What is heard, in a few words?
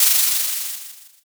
scroll open